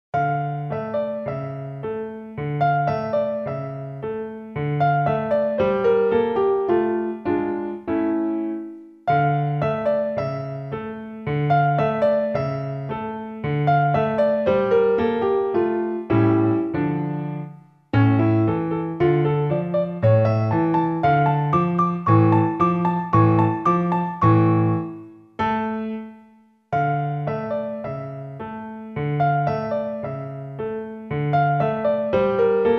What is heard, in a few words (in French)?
Novelette ré mineur, piano; Gurlitt